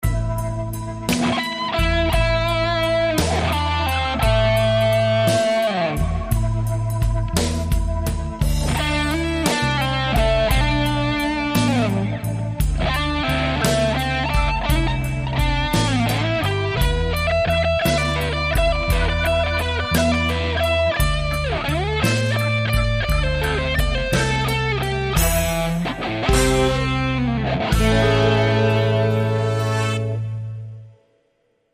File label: Blues